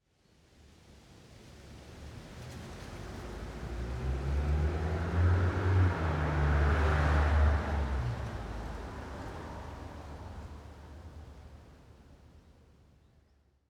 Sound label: diffuse car pass by; aXLsound